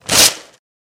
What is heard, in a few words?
Paper Rip Quick; paper mvmt